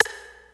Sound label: sparkly acoustic snare sample g sharp key; Duration 0:01; snare drum samples